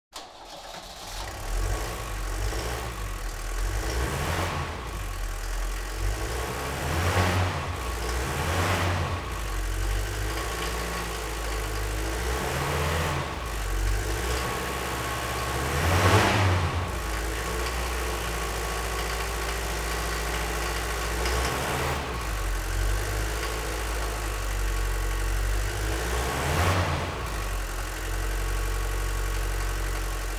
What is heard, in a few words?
Diesel; Работа дизельного двигателя.; Tестовые сигналы и звуки.